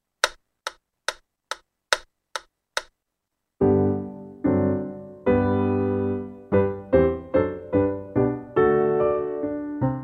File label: Gavotte Accompaniment; Miniaturen Op92 No1